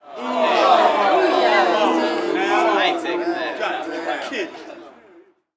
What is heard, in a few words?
street ball crowd boo; SFX Kit; Crowds